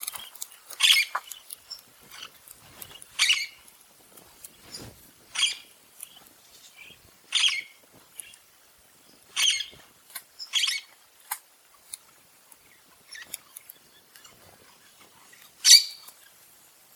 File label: red winged parrot